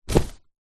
bag teachers fabric containing books and paperwork set down on ground; foley